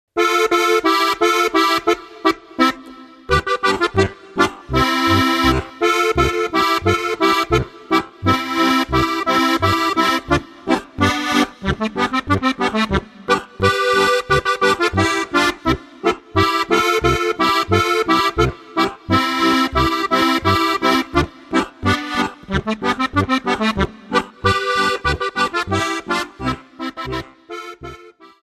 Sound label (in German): boarischer